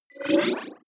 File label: wobble rise short